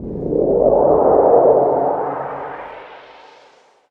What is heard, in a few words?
weapon fire